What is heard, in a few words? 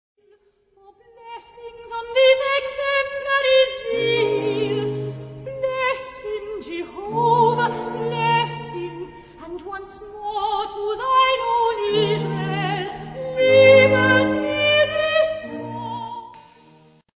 Recitative; George Frederick Handel; Judas